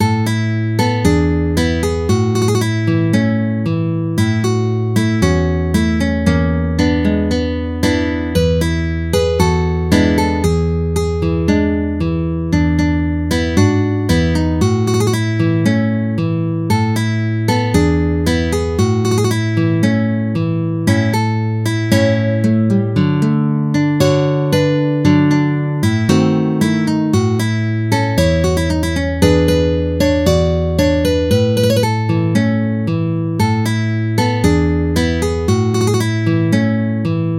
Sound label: cubanita